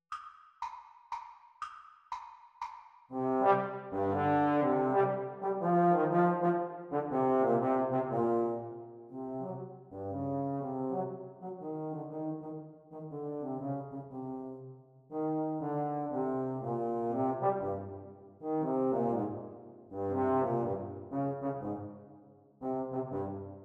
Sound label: Playalong for Trombone, Speed; Exam Speed